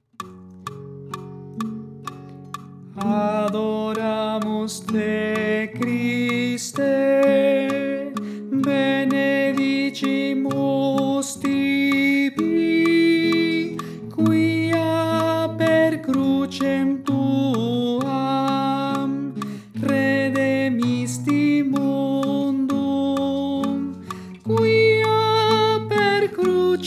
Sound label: Contralto; Adoramus te Christe